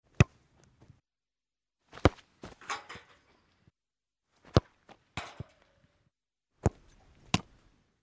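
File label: several kicks, there is one in the net; Звуки футбола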